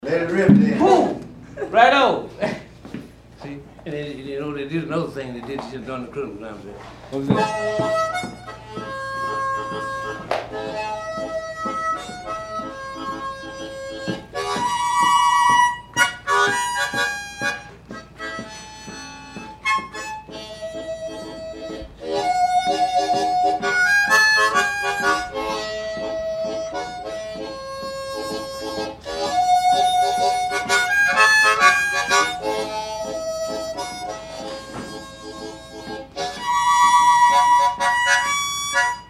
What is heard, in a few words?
HARP